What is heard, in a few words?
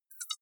hover high tonal